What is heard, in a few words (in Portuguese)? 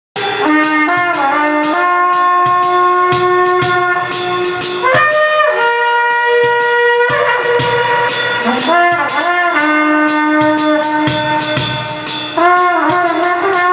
trompete